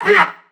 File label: Pain, Crewmen; Dialog; Barks; Battle; Warframe; CorpusCrewmen; CrewmanD